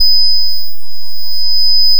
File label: additive cello single; itakura saito